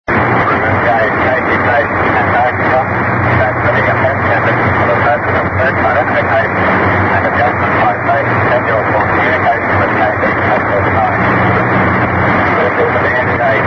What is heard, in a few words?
casey station